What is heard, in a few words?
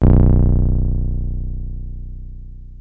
303 A1, TB 303